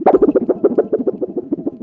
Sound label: WHIRL; tenpin; PC Gamer 44